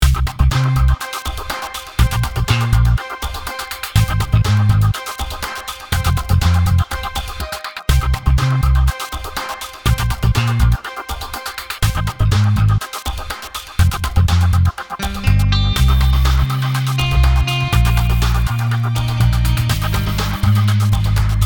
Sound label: Robo Western; Incompetech